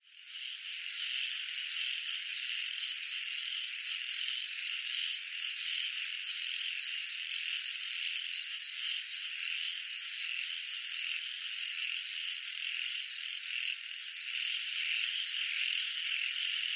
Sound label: field, nature